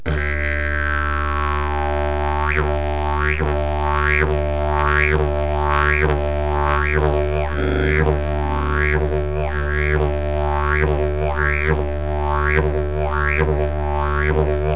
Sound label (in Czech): jilm cerveny, didgeridoo